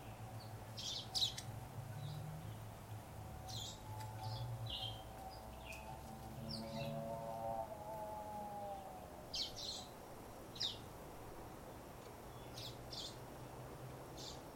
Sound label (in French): Zostérops gris de maurice